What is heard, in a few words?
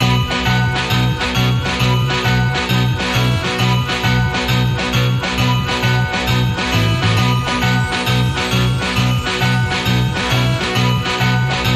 Rock n Roll Christmas, No Drums